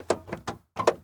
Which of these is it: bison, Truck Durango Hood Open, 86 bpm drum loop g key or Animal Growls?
Truck Durango Hood Open